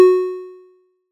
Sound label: waveguide A4